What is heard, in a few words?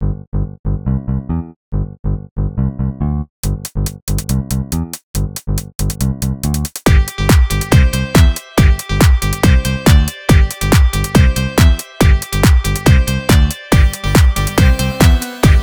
funny melody